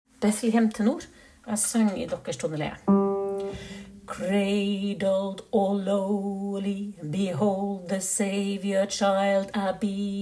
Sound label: tenor; Bethlehem